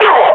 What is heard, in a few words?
pain, combine grunt